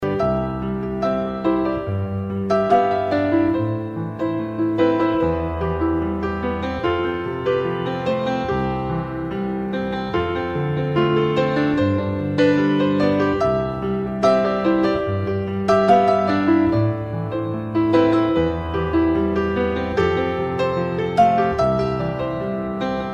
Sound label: variations on canon in D